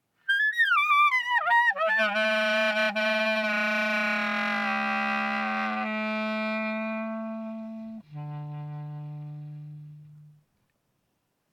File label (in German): Schritt Frei Ober Rau Ton Kurz Spiel